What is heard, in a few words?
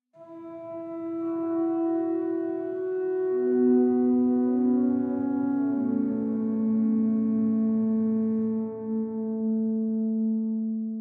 Organ Mid